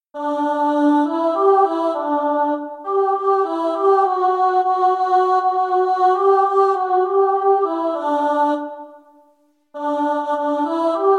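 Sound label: Shosholoza CHOIR karaoke alto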